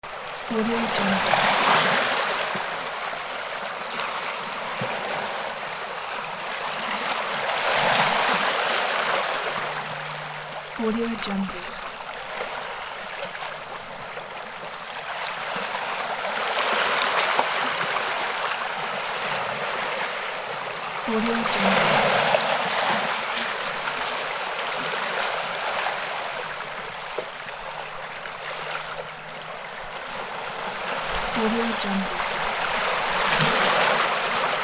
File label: ocean waves